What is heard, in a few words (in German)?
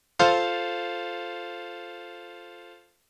Akkord; theorie; Akkorde